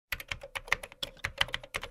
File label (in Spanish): teclado